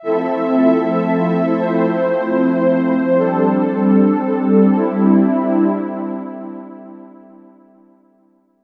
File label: keys sad; Loops